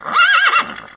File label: tram; NFS High Stakes